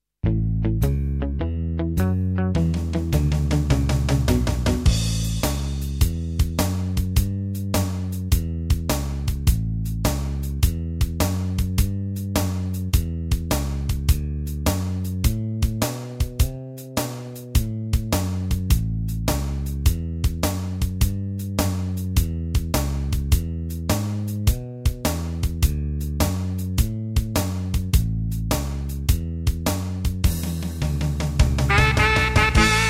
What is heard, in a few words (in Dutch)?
Blues schema in A; Meespeel CD